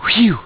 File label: Phew